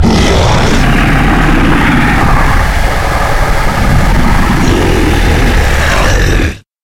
Alien Roar; blindside

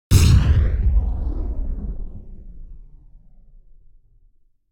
Cannon Firing A Rocket; Hollywood Edge; sound designer's toolkit v2

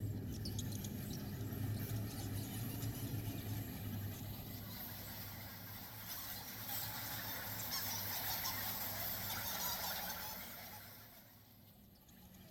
Harbor Village West

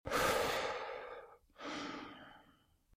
Breathe Slow heavy breath; Eden; RadwinIsland

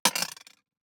DICE on Glass, Throw and Roll, Metal, Two Dice; Samples; ArticulatedSounds; DICE Sfx Party (Extended)

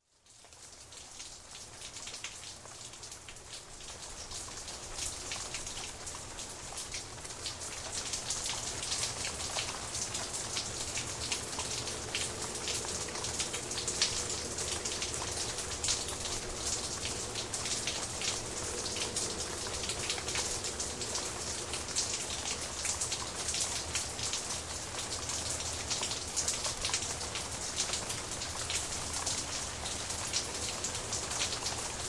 Rain, Light Traffic; Sound Ideas 2000 Series

